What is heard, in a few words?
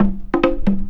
CONGA LOOP, Perc Loops, Techno Trance